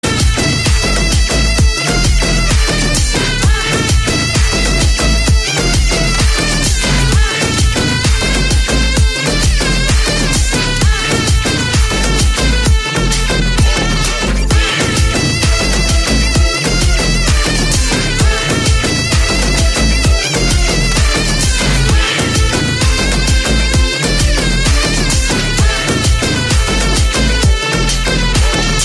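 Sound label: background music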